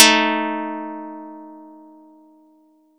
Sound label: pluck; Audacity; 6 12